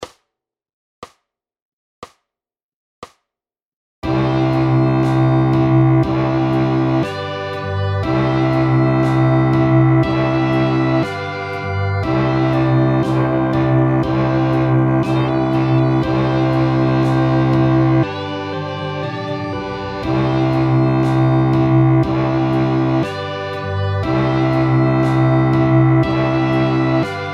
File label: cello